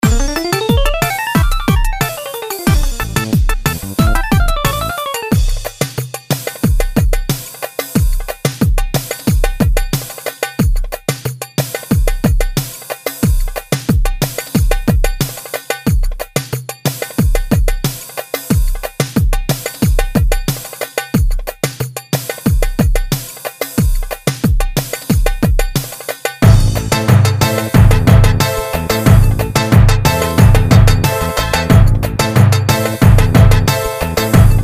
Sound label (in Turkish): gw8 ciftetelli, ritim